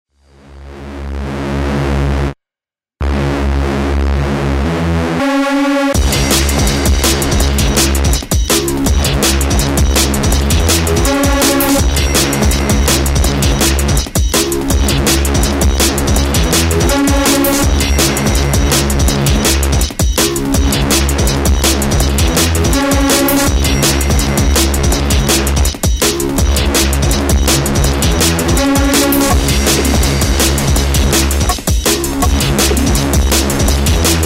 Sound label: fight track